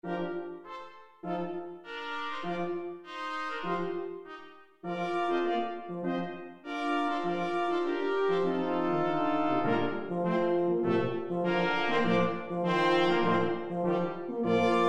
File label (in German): blechblasquintett